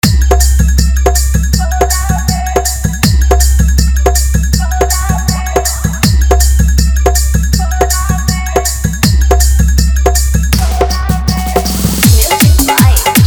Bhojpuri Hit

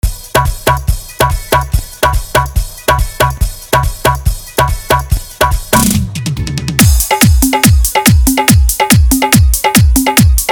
Hard Dhollki Bass Mix

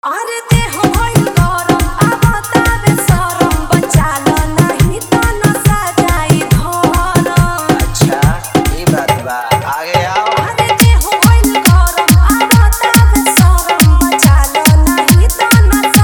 Bhojpuri Viral Hard Dhollki Bass Mix

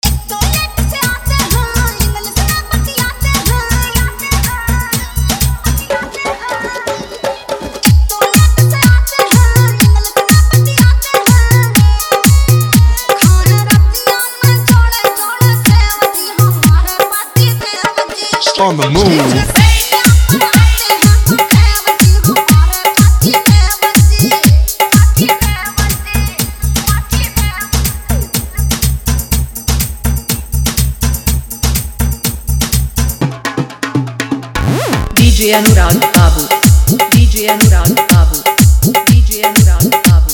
Bhojpuri Hard Dhollki Mix

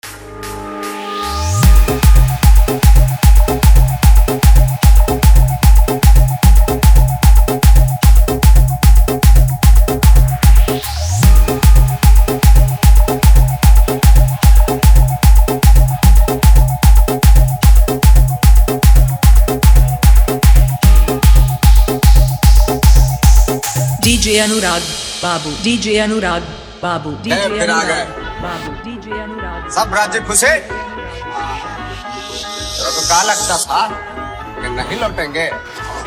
Remix

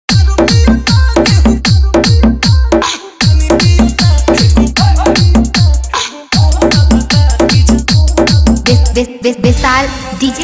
remix

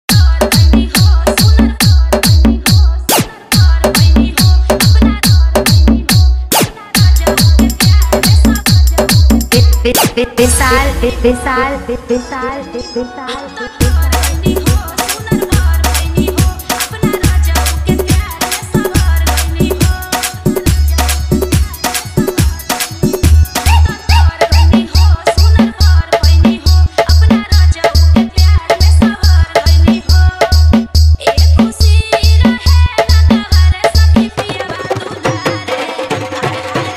dj bhojpuri

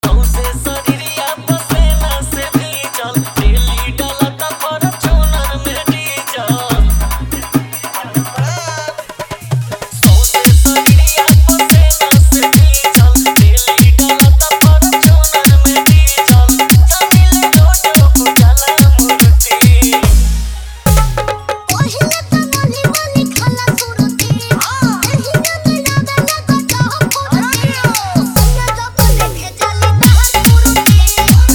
Dhollki Bass Mix

